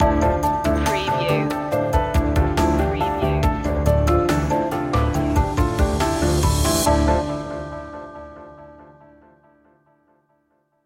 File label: mind matrix stinger